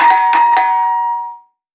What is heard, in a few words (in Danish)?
Dørklokke; musikquiz